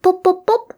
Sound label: pop pop pop